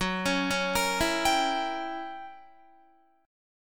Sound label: Gb 7th Flat 9th Gb Guitar; 13,16,13,15,16,15; Listen to Gb7b9 arpeggiated